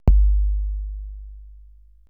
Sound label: PERC MIX